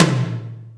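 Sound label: Md Cannon, Alesis D4 drum machine tom; 300 Drum Machines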